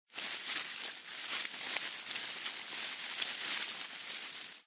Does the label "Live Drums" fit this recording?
No